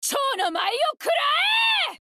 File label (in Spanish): grito; psyloke